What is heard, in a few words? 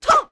Sword; The Wind Waker